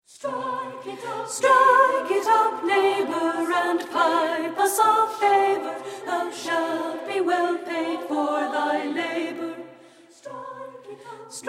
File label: ALTO